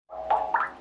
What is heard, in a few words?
menu hit; osu!; Night-Gre3n baka nocomboburst